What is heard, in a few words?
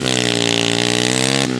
power, Engine